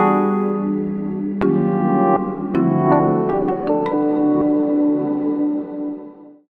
gameplay interstitial chillaxin; vitamin

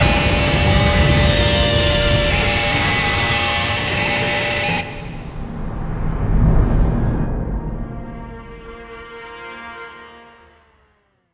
Big Level Effect; Tony Hawk's Downhill Jam; Wii

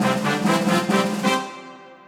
Se Coop Success; Summer Party Time

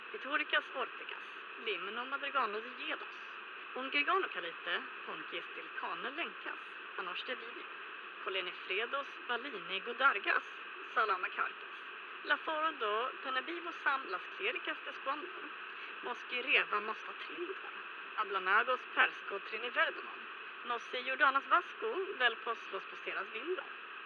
TV studio; teardown; ps5 windows xbox series xs

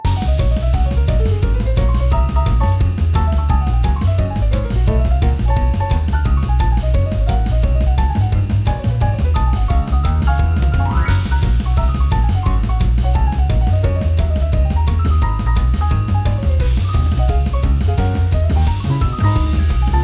Gameplay

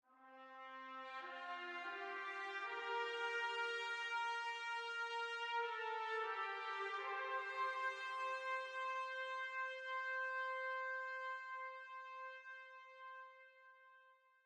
Quite noble festivities

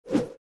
Twirl; Fancy Pants World 1 Remix

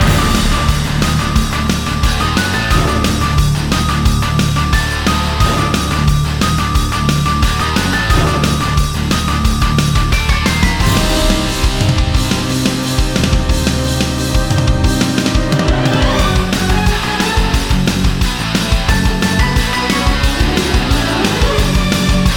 BGM Boss Fight Loop; Fallen Knight

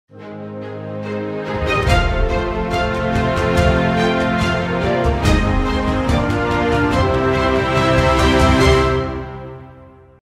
Victory Music; Town

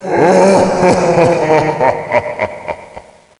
LAUGH; Voices Tchernobog; blood fresh supply

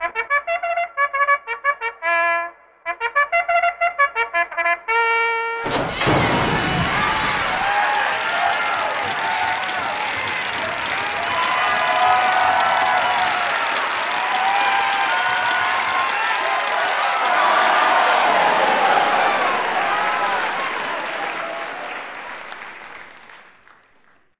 Horse Race

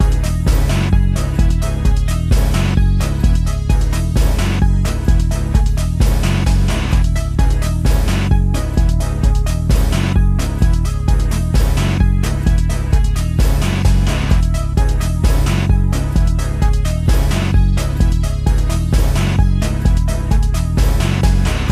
BGM; Adventure Player; psp

